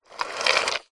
household jewelry in wooden box tip slide lid open; zapsplat